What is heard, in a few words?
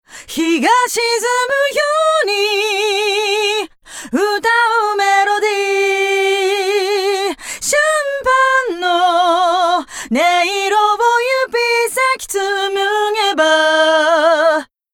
Chorus Flanger plus Cho